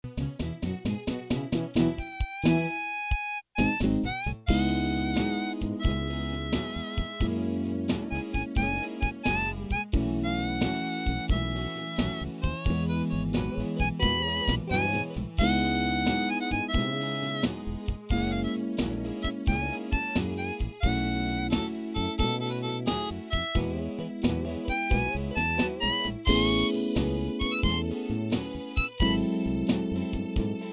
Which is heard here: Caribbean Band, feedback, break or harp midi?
harp midi